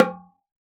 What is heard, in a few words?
oxaca drum, percussion; ILIO